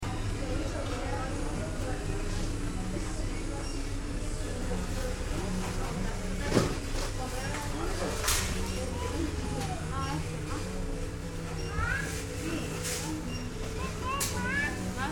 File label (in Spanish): supermercado; corrientes; bella vista; la fleha